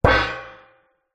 impact metal lid trash gong like; zapsplat

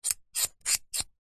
foley metal slider small slide; zapsplat

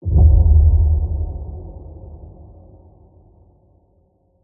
Impact; PMSFX

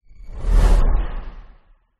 whoosh; orlando lanzini

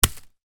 sport baseball land on grass; zapsplat

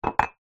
mug cup ceramic set down kitchen worktop; household

